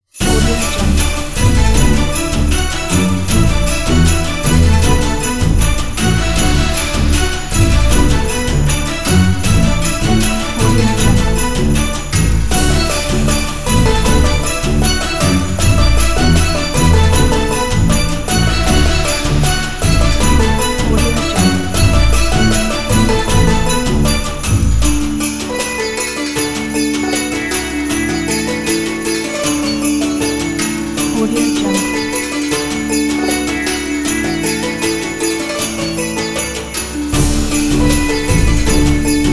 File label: chinese new year